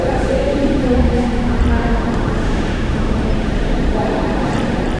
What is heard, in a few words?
museum ambiance